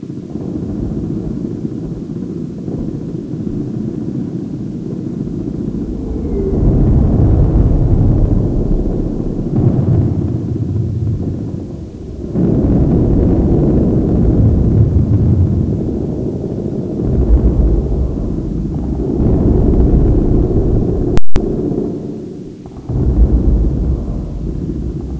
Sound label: dog only underground, ambience